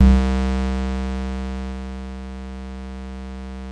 low buzz

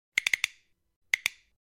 clicker; fox

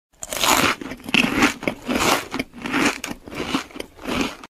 munchin

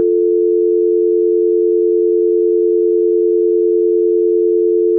dial tone usa

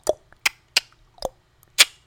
clicks but cooler

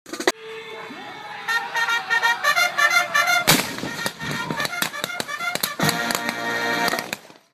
cavalry charge

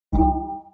menu button; gta iv

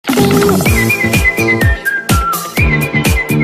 rat on dig

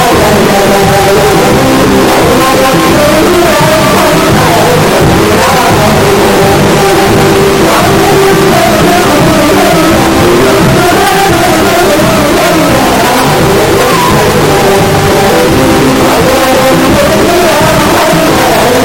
hhhhhhhhh

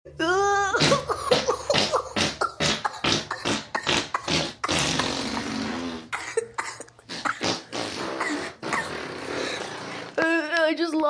laugh queefing

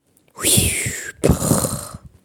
mini grenade

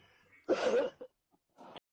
scream

